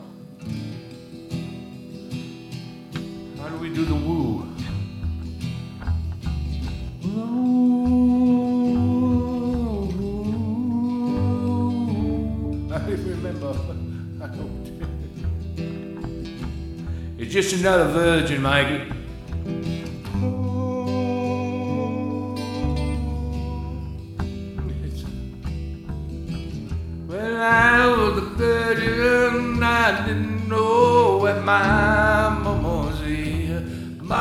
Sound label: Wail